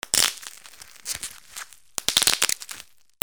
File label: BREAKING